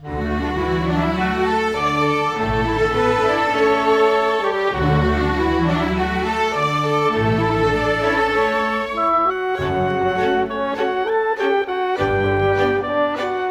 Rock Pop, Orchestral; Sonic Foundry (Sony Creative Software); Orchestral Series VOL-4 - Rock and Pop

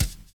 BRUSHING; Univers Sons

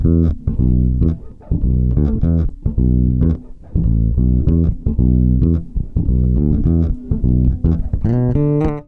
SAMBA, Bass Grooves; USB Soundscan vol.30; AKAI

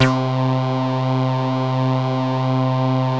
CS-15, BASSES; Masterbits - Vintage Voltage; VV BASSES

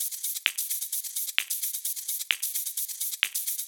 Live Percussion, 130 BPM

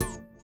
VIBRA; Spectrasonics; Metamorphosis

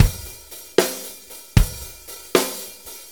6-8 Loop, Full Kit Loops; Drum Tools

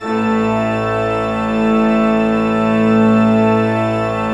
A1, Cathedral Organ; ILIO; Propeller Islands Cathedral Organ

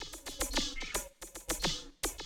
drum loop, 100 BPM

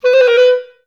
B3, orchestra; Best Service; Peter Siedlaczek's Advanced Orchestra

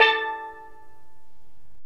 STEEL DRUM

